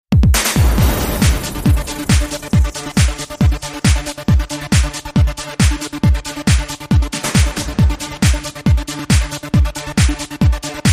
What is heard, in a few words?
Hook Kick, Rhythm